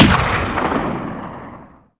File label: Sniper; BaboViolent 2